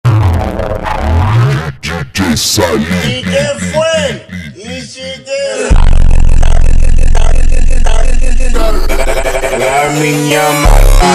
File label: Funk ultra Slowed